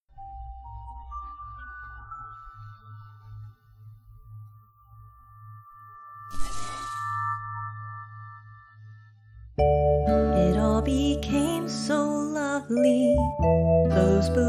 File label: Song Cover; Erotic audio